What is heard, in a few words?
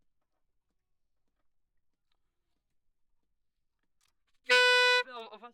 Sax; Gefell